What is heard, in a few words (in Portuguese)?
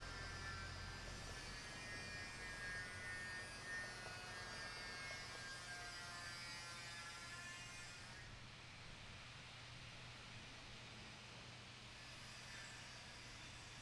Construcao civil serra de corte e betoneira na vizinhanca com transito longe; e aviao